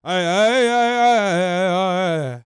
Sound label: World Voices, CHOCTAW